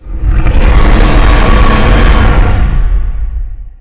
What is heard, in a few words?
roar; xiao